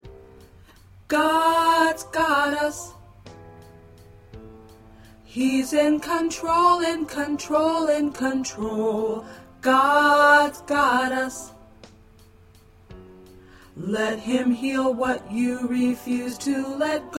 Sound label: Tenor, choir